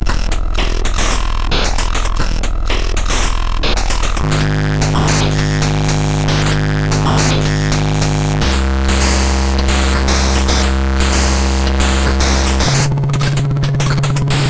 Drum Controller, MOS6581R2

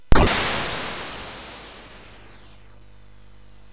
Frantic, MOS6581R4

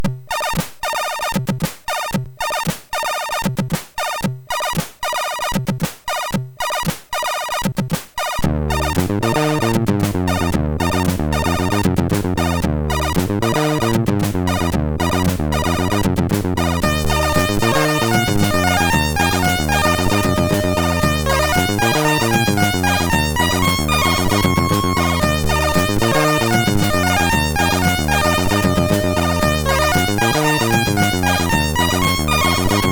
Wind Surfer, MOS6581R4

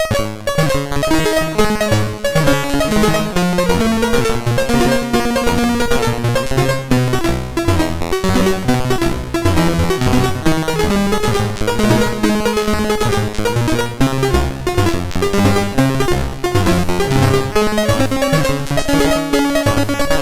Randomly generated music BASIC, sid MOS6581R2

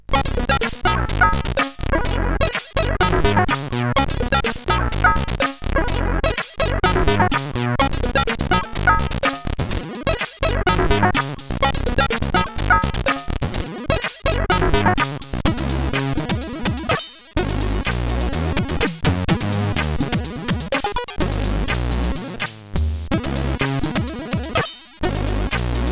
note, CSG8580R5

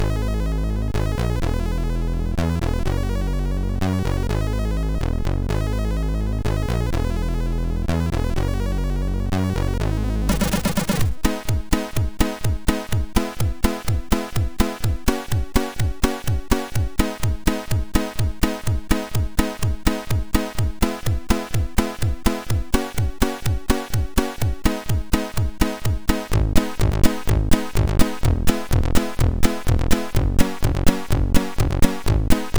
Melody, sid MOS6581R3

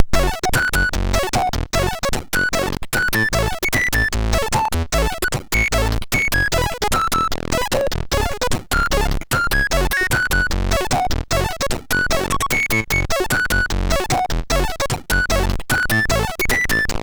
Hardsync sid MOS6581R3

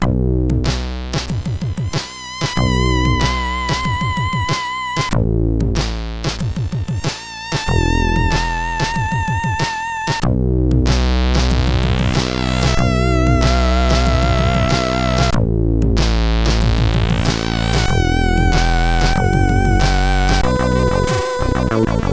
sid CSG8580R5; Hopeless; hvsc

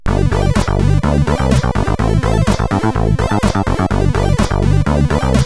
tune sid CSG8580R5

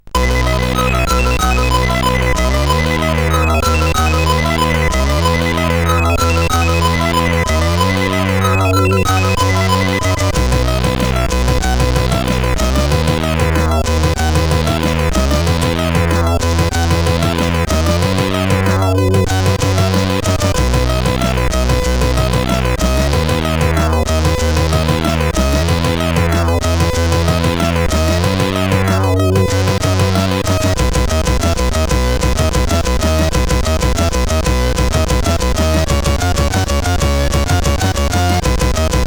Turbo Tune, sid CSG8580R5

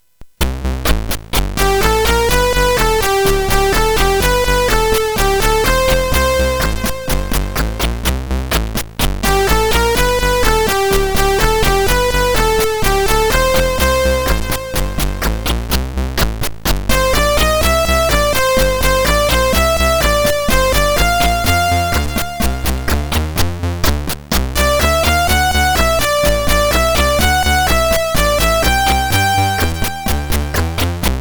Musicbox; CSG8580R5

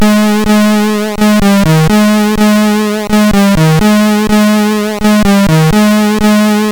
Melody, MOS6581; HVSC; 3-Tunes; MOS6581R3

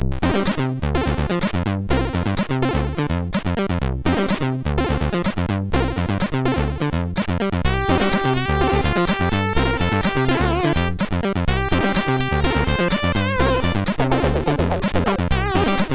Slappy Funk, MOS6581R2

